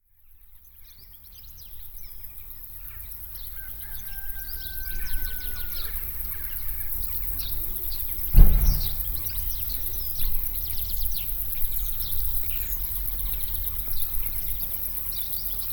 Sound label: morning ambience; kuzmino